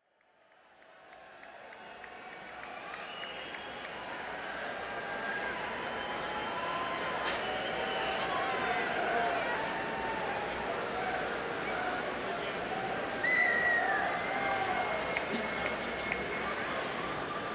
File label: Crowd; Mansfield, MA - Xfinity Center